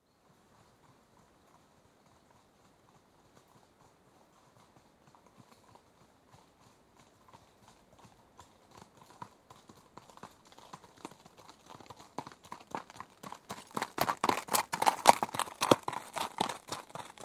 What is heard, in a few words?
Two horses approach at trot, pass at walk on gravelly track, right to left; Horses Trotting; BBC